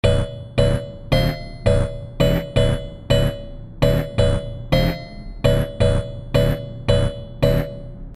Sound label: loop mouvement 111bpm